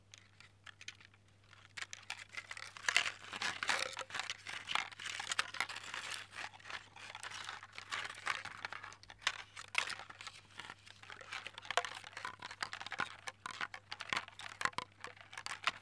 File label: Dry aubergines